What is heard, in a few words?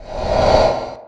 Magic ICE PREP